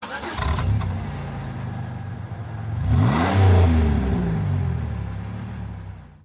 Car Engine; knight rider

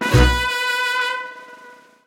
c near Win; willyshotchillies

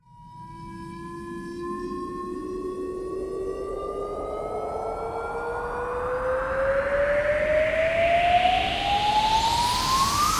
near Win Slide; willyshotchillies

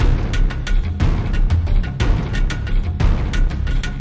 Jackpot Intro Loop; mercy of the gods

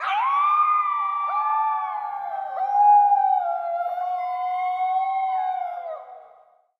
howl; the wolfs night

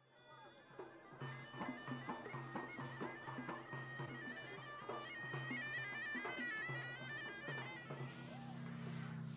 snake Charmer; wild bazaar

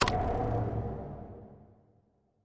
spin Button Click; halloween jack

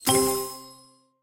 lion collected; serengetikings

